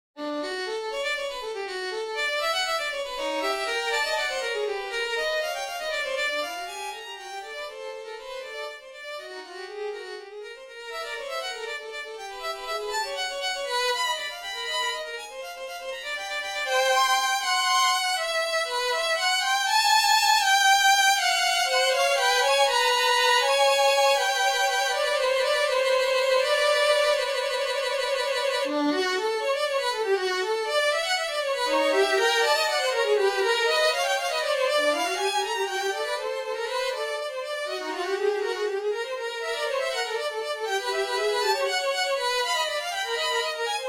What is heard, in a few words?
Fugue